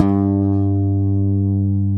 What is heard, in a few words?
WAL BASS